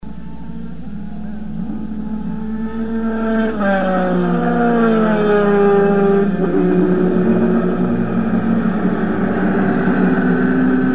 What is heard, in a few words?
125cc Race at Assen Holland